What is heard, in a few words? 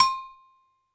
Agogo L, Standard Kit, Yamaha PSR-E323